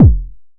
Bass Kick, Jomox Airbase99

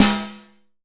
RING; Masterbits - Total Zone; DRUM ZONE

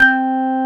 Korg CX3, C 1

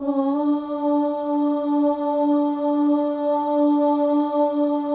OH, FEM, Symphony of Voices

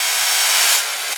FX Loop, Drums & Drones

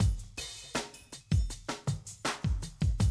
Classic DrumLoops

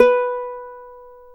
UKULELE B3; AKAI S-Series CD-ROM Sound Library VOL-7

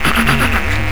RANDOM SQR R, MACHINES

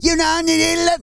SING, Vocal Planet R&B & Dance; ILIO

